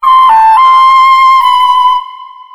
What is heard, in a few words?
SHAKU LP FX, Asian Traditions; Soundscan vol.60; AKAI; SHAKU LPS